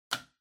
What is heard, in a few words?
Kitchen Timer Magnet Stick